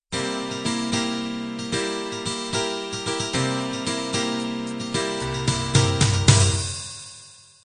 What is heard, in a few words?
celtic ballad intro